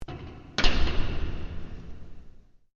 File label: Door Metal Large Close